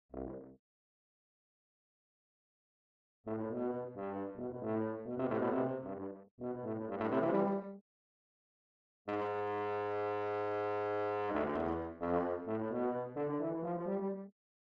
Tuba; Holst Jupiter

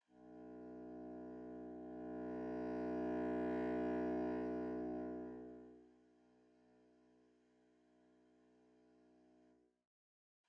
Contrabassoon

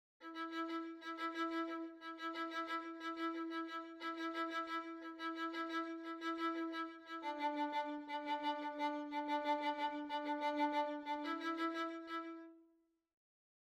Violin; Overture or Something

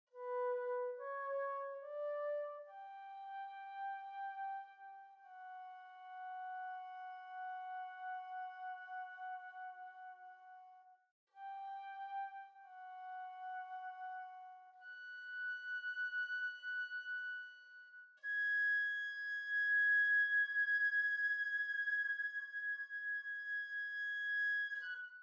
Flute

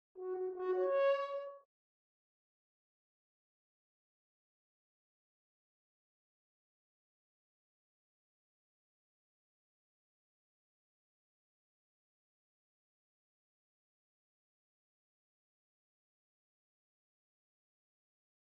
Horn

